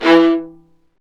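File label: Marcato, Strings; Vlas Marcato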